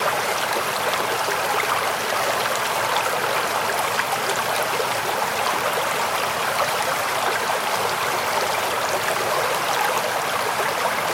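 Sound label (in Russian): маленькое судно качается на синих морских волнах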